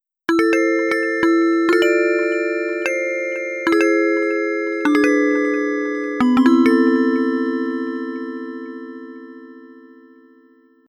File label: A short and slightly creepy piece of music for a music box.; abandoned house